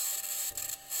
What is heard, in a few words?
mic, mars2020 edlcam